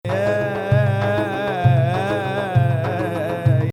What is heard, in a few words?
Ajam Nahawand